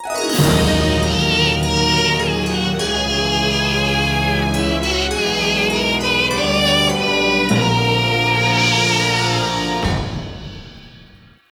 Course Intro Fanfare; crash bandicoot series sound box

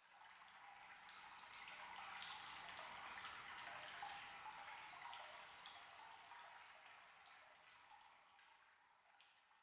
Calm; super mario maker 2; Peaceful SFX Raws - Otoasobi Calm SE

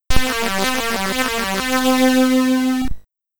Plane Completed!

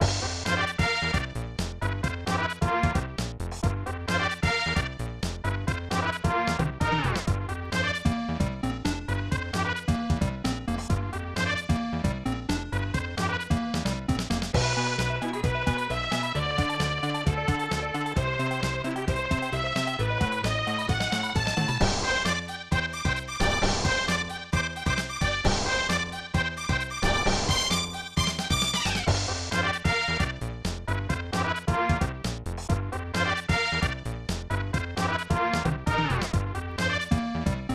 Rock Concert

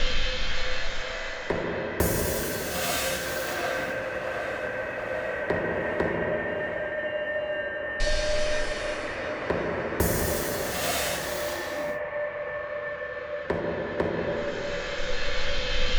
Danger Tonal; Insanely Twisted Shadow Planet

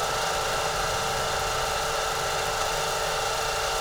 Panel Vcr Loop; Among Us

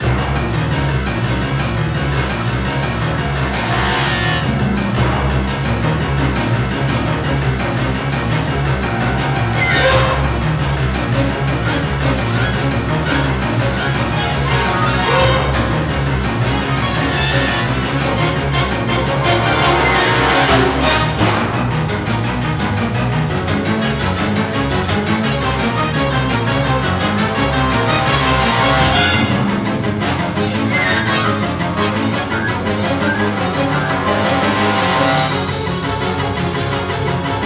Nervous

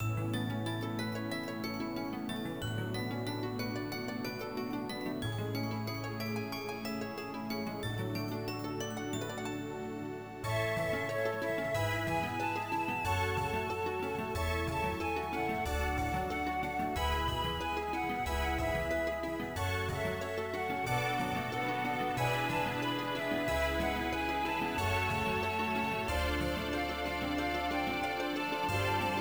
Instrumental Version Ending; TwinBee Yahho! Fushigi no Kuni de Ooabare; Konami GX; arcade

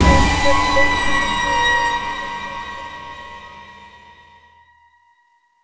Sting; Stronghold

